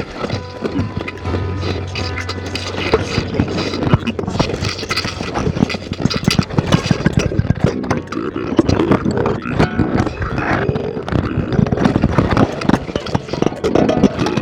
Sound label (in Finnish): radiohear jinkku